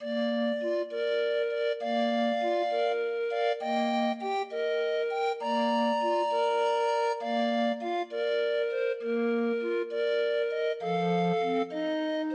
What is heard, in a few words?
O Holy Night, Grind Organ, Demo of 20 note MIDI file